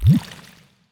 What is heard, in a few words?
Filter-Portal Pass-Through